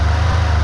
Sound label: Rev Car Loop; UrbanChaos